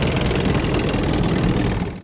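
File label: tank movement; Spanish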